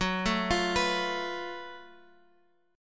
F sharp Minor Major 7th Double Flat 5th, Guitar Open F; F#mM7bb5 arpeggiated; 18,16,17,18,x,x; chord shape